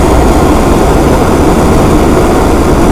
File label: smelter work loop